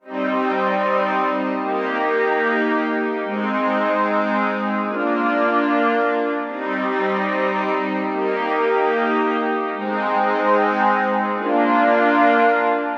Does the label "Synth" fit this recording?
Yes